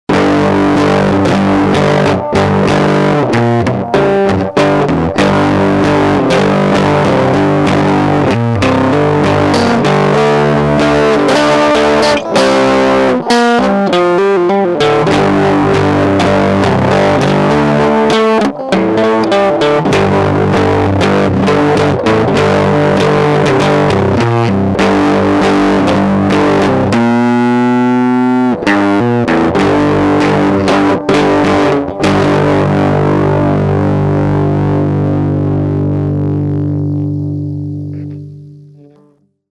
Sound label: filthy dirt, amp head; dr zee se cdc amp head